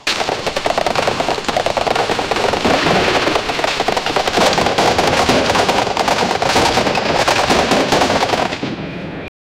sounds of an old war

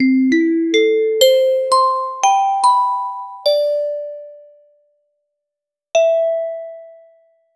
short positive quiz sound

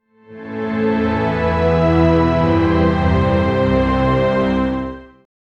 make mission complete sou

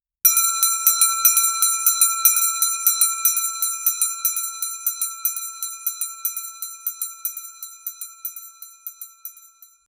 gentle single, bell

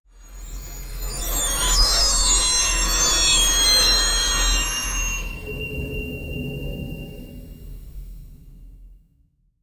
anime cosmic energy summo

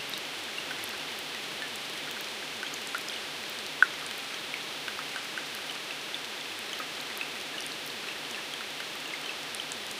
steady nighttime rain fal

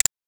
short sharp tick

